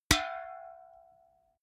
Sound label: big can hit hard